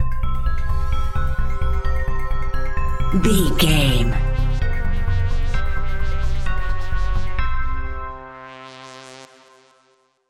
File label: 80s synth sci fi stinger